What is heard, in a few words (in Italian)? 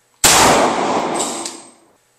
colpo pistola